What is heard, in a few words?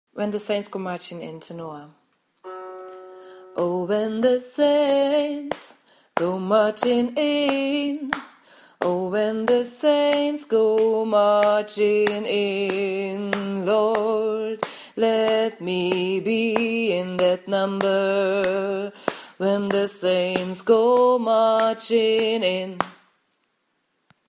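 When The Saints Tenor, gospel choir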